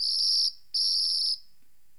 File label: BIRD, bird call; Greytsounds VOL-1; BIRD CALL FX